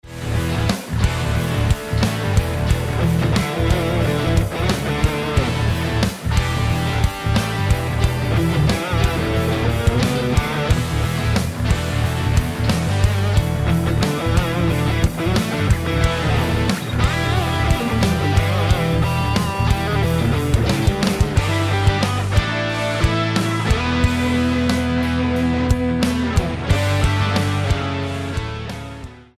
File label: rock